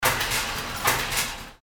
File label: carton packing machine